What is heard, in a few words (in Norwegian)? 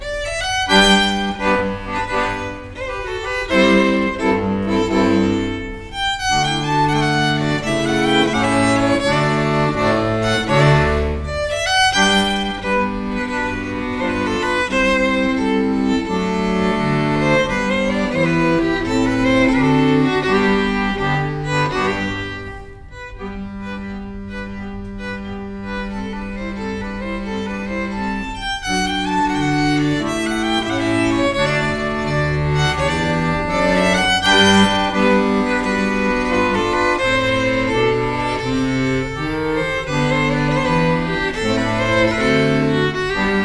Brudemarsj fra Seljord fiolin og trekkspill